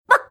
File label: barking sound